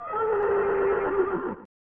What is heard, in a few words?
Horse Call